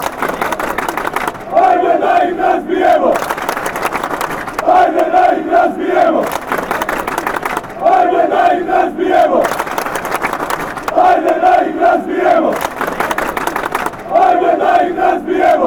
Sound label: montenegro chant